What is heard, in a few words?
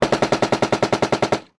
outgoing machine gun